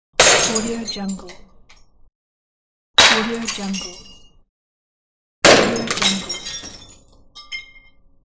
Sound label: glass breaking; monkylabz